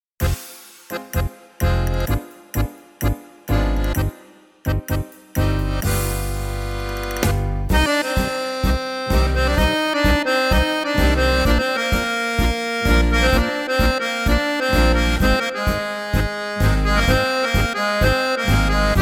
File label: TANGO